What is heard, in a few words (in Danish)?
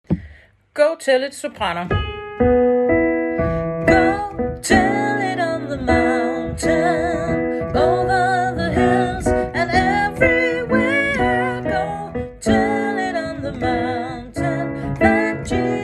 sopran og bas; go tell it